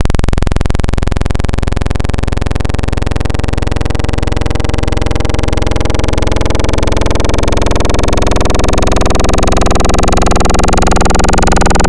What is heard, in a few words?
Crunchy Lead, Wavetables; Cymatics; Nightmares Vol 2 Wavetables; Serum